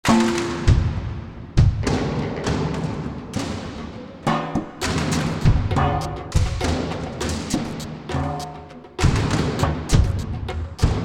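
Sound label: Battle Loop Good Rural Percussion; Fallout: New Vegas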